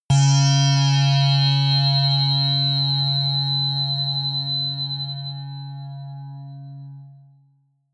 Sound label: synth saw hit high